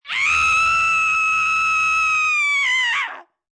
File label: Scream Shriek, Scream, female, long, raspy, descending, fear, terror (human voice); Screaming; VoiceBox